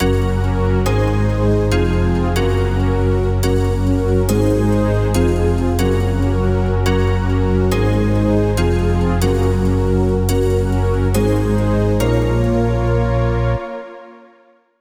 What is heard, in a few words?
140BPM G#; Vengeance - Essential Clubsounds Vol.4